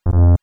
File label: wrong; SNES; SMRPG